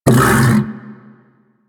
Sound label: Vocal Tranq Grunt, critter; Warframe; Hunting Critters; Undazoa